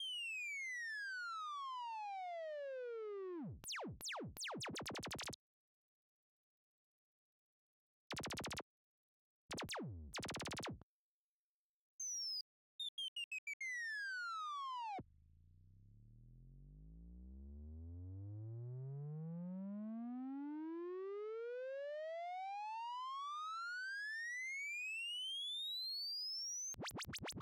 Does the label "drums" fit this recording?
No